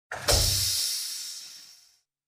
among us door close sound effect

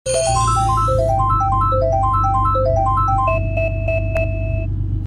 item roulette sound effect; mario kart 64

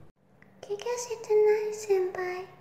warning; saiko

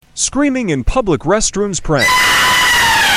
screaming in public restrooms prank

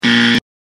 buzzer

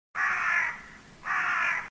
kraaah

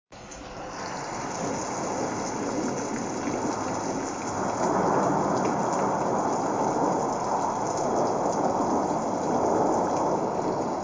rain storm

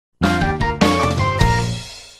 tv plus startup sound; abs-cbn

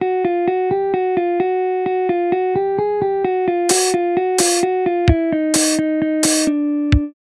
creepy tune

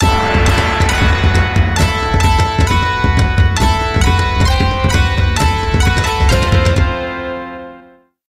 sakura folk japanese koto

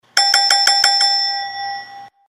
ding ding ding

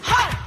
heart

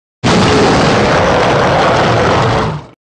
roar; deviljo

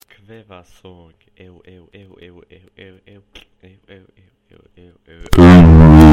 song

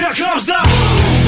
boom

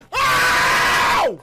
aaaaaaaaaaaaaaaaaaaoww

